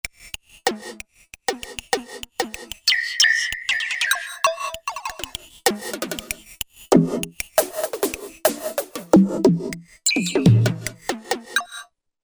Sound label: Tune FX kit; Korg MSC-10S Ethnic